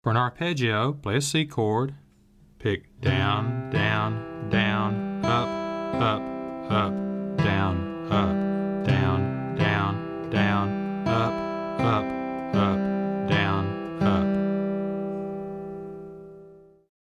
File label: Arpeggio